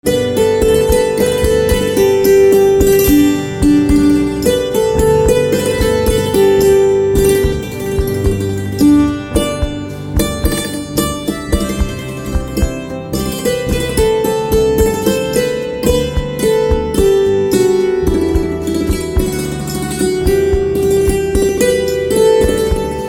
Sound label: Santoor Instrumental Ringtone